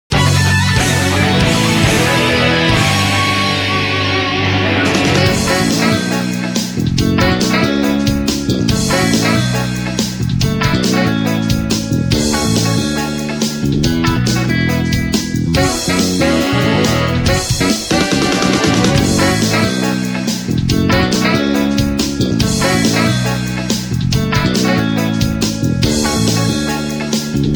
Loading; JoJo's Bizarre Adventure Eyes of Heaven; PS3, PS4

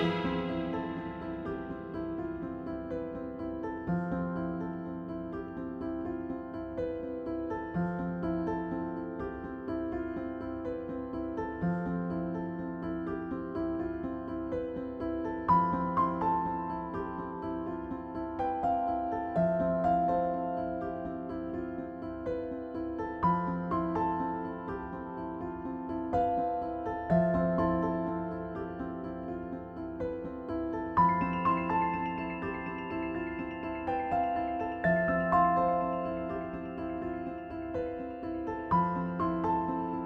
Ice caves Loop; Twilight Monk

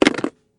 Impact Plastic

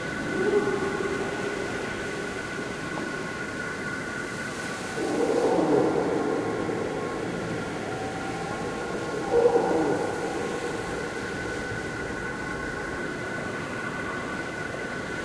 infestation zone ambience loop; Just Cause 4; DLC Los Demonios; PS4, Windows, Xbox One gamerip

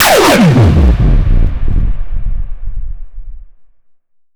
Fx Impact Delay; bass house; fx vocals

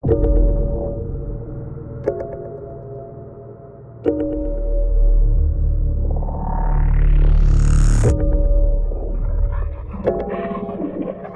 Hub Music; Voidbreaker; ps5 windows xbox series xs

